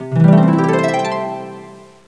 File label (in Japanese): harp glis, TrinityのHarpです。グリッサンド（アップ）です。